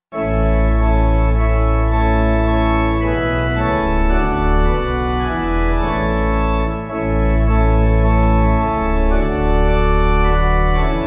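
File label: Pipe Organ, Music Backing Files for Churches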